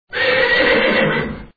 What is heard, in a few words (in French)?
cheval